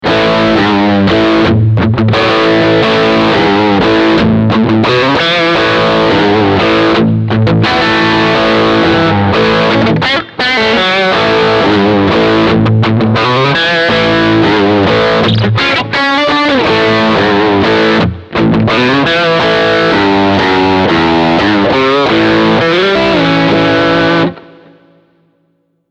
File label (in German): Beide HB Riff Crunch